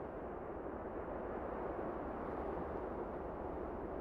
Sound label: dist wind; ambient; outdoors